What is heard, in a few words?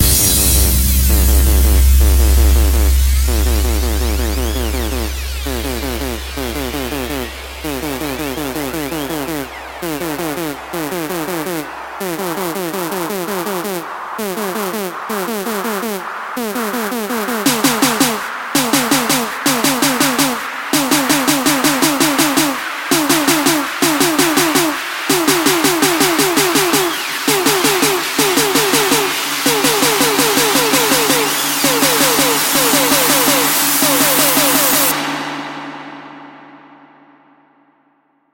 Kit Loop Full